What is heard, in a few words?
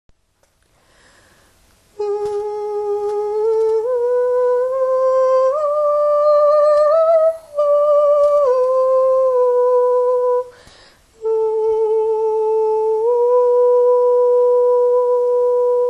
tenor; oltra a la nanita